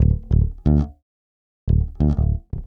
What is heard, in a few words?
Octaves Lick